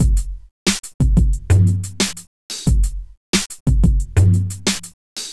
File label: first windmill 090bpm; Zero-G; Downbeat Dance Grooves